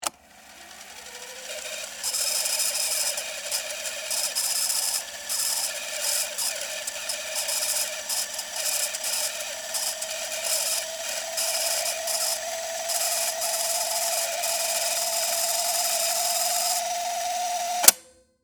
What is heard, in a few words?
Technology, Tape, Cassette, Player, Rewind, Tape, Panasonic, Handheld, Recorder